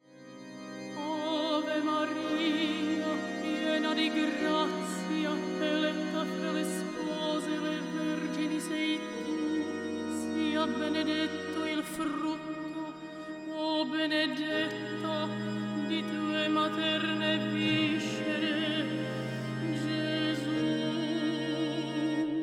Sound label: AVE VOCAL; VERDI; svatby